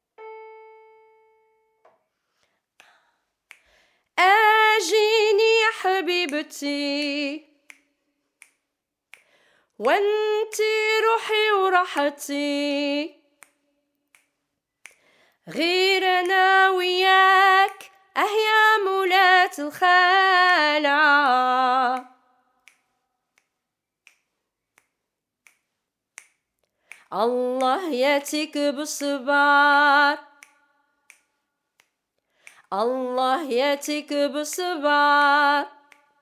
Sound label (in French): AL-KHALA CHANT 2 GROUPE 1 APPELS